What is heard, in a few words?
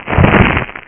FIRE